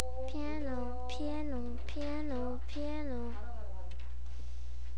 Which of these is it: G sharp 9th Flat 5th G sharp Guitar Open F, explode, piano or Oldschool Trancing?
piano